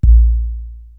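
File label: bass drum Deep Loop, Korg TR-Rack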